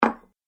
Dessert Bowl Placed On Wooden Table; dishware; household

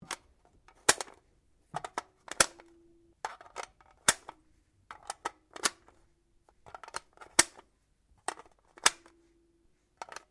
RIFLE STYRE AUG 5.56MM MAGAZINE LOAD 14 ROUNDS; A selection of domesttic firearms; Guns

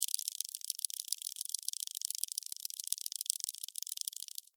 Insect Run Cartoon, Insect, Run, Cartoon, Bug; Animals:Insects; Insect Movement; Blastwave

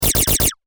Science Fiction Laser Machine Gun Short Burst; Lasers; SFX Bible

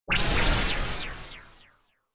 Laser Swish Delay, whoosh sound effect

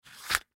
Combat Knife In To Holster; Warfare; Knives

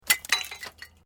Metal Light Movement Minor

